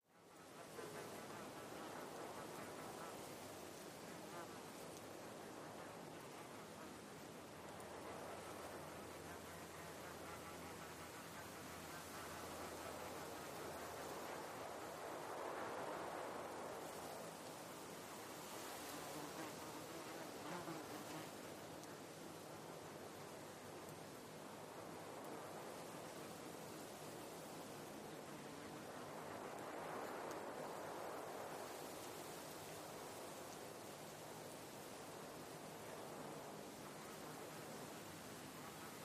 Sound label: Wyoming Cicadas Fiel